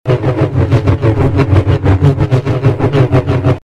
lightsaber spin

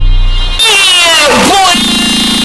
yeeeee boyyyyy

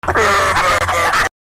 laugh

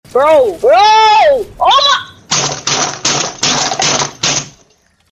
screaming rage

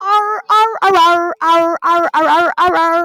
augh augh

